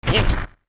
Land; Tingle; Zelda; MM

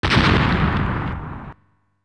Bomb Blow

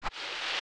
push crawl sand; zelda; OOT

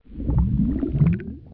Bubble; Biri; zelda; OOT

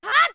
Attack

